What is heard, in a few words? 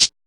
DIRTY SHAKR, SHAKERS